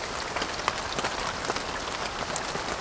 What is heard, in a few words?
rain grass; The Sum of All Fears